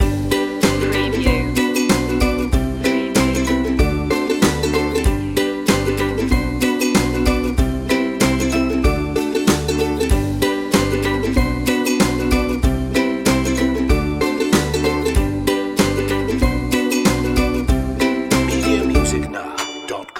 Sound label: caribbean joy loop